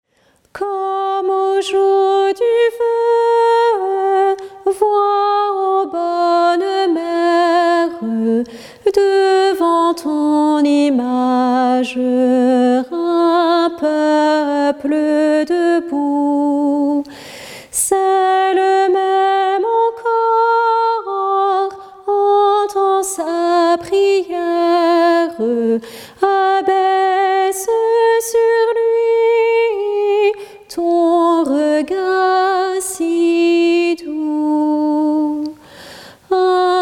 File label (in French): Chant